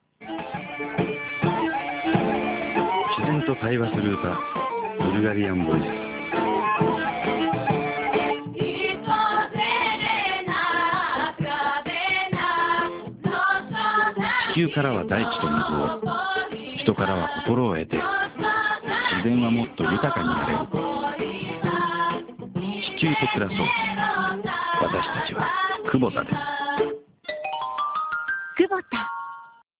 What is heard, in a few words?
Bulgarian Voice